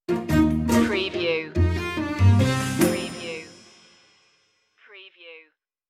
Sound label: curious machine stinger